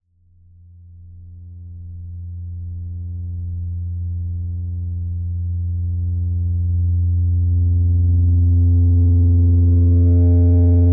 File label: Prop Airplane; Saguaro one